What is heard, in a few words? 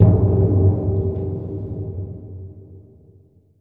Tympany